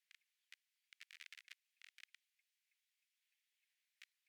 DRONES

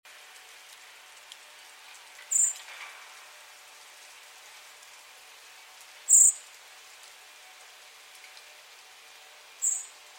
Turdus merula; SETE

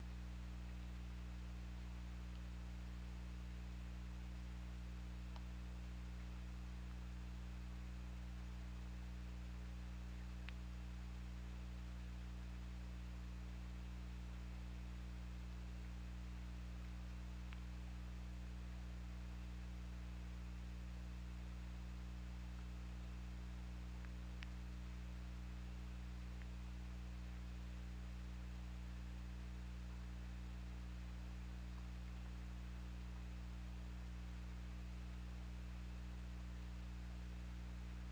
Orca; OrcaLab

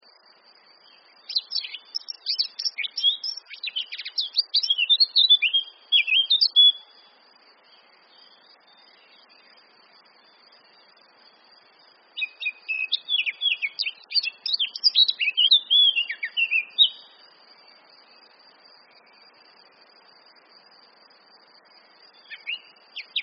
Sylvia atricapilla; SETE

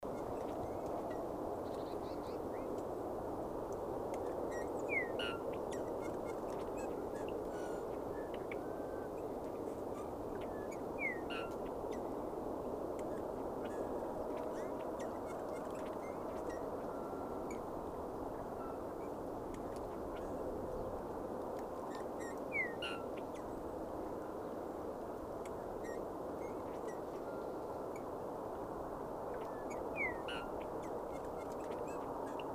Pyrrhula pyrrhula; SETE

